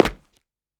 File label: Footstep Tile; Opsive; UltimateCharacterController